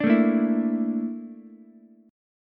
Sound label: A sharp Major 7th Flat 5th A sharp Ukulele; A#M7b5; strummed; JGuitar